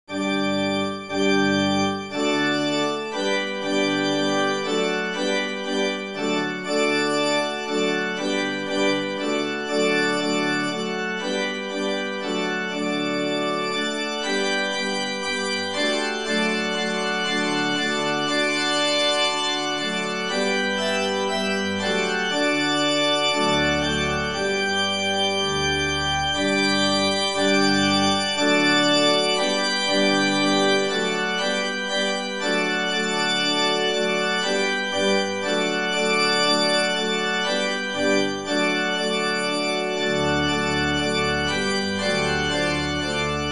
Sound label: Allegro Maestoso Handbells Organ